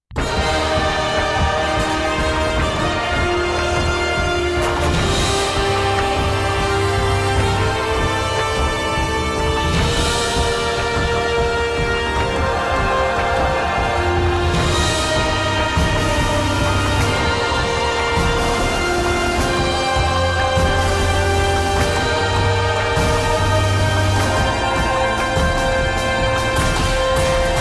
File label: Ark Final Battle Loop; Infinity Blade 3